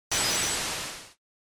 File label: SNES RPG Magic Ice